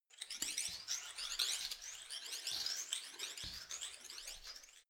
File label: active organism